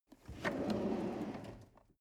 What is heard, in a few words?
drawer medium open draw